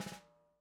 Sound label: snare drag, gretsch kit